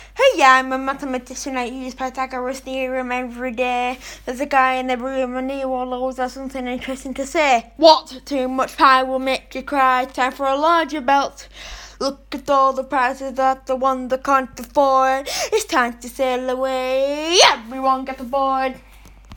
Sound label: Math rap